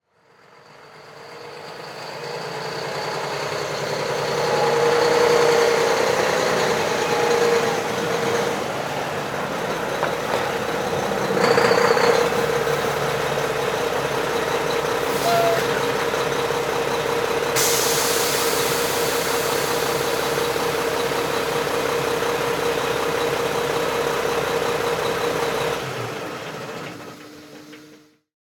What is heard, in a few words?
Semi Truck Mac; Blastwave